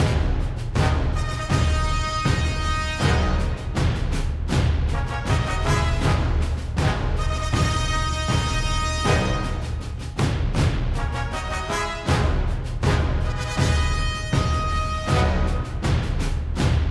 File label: Escape Loop; Cruiseship; uncharted 3 drakes deception ps3 gamerip 2011